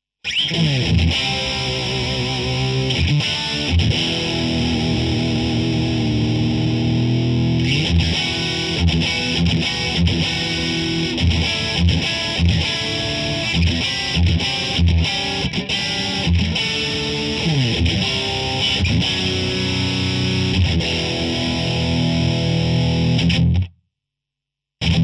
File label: Strat Modern